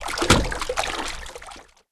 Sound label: water splash